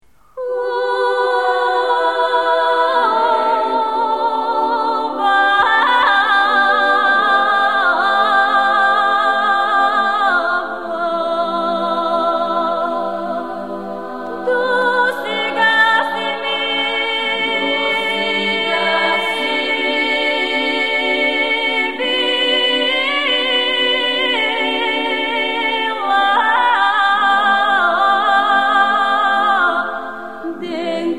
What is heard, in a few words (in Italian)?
Voci Bulgare Esempio Cromatismi; Cori Polifonici